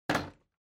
Vacuum Cleaner Body Put

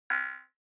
OS Sound (Speaker-Notification)

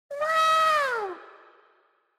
Parody Accent Reverb

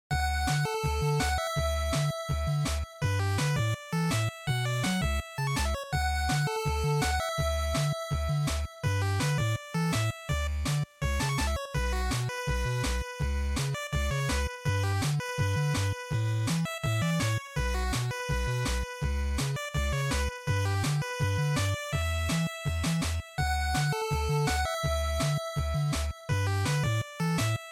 GB Sports Title

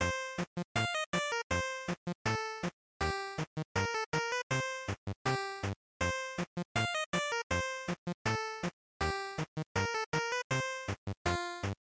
GB General ED Loop80

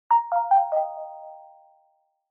Smartphone UI Sound Speaker

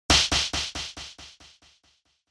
Hit Slap Delay; 打撃 平手打ち01-6(ディレイ)

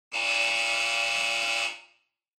Electromagnetic Buzzer Room

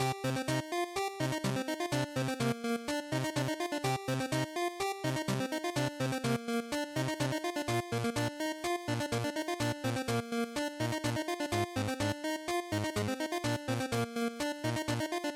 Shooter, Boss, Loop

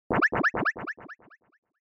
Anime Motion Delay

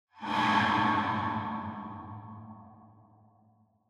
Scene Flashback Short

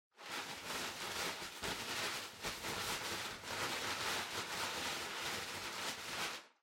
Packing Paper Far Rustle; 梱包紙01-14(遠い ガサガサ); 効果音＞梱包材

